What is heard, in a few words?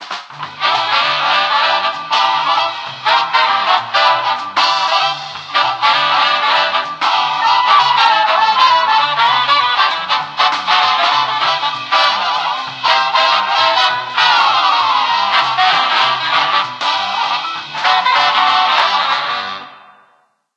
Conch Shell; Rapa Nui Choo Choo